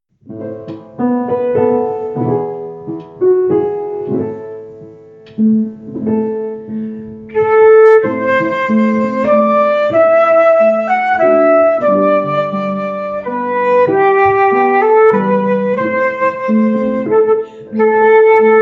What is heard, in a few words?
Greensleeves with solo flute